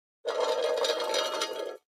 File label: Coffee Can Rolling On; barrels buckets & cans